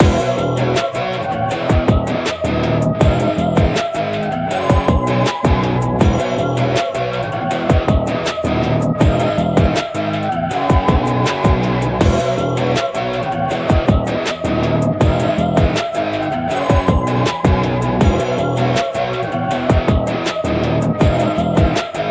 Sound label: nightmare loop